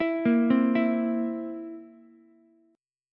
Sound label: Bb Suspended 2nd Flat 5th Bb down Ukulele; arpeggiated